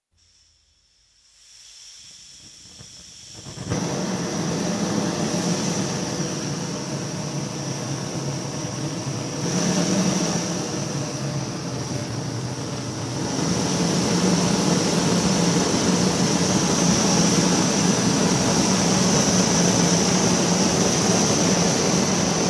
Gas blowlamp lit, used to heat surface, changing acoustic, off; Lamps; BBC